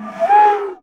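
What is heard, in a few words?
JAPANESE FL, BAMBOO FLUTE; Voice Crystal - Steve Reid Definitive Percussion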